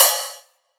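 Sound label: Open hi-hat; Zero-G Trance Inducer; 90 s Sample CDs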